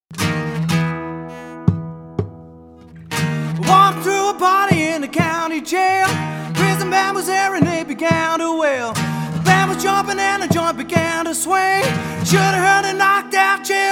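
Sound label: Solo Acoustic, Singer Guitar Player; Guitar Vocal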